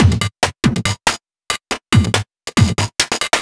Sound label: Breakbeat 140 BPM